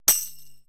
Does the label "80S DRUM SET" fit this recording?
Yes